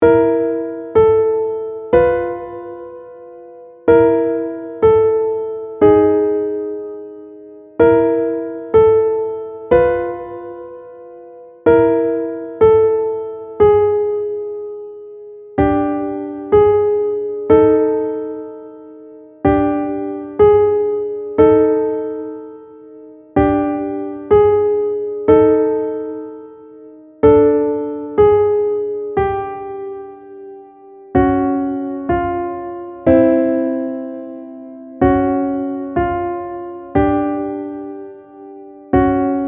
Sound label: Loop Pf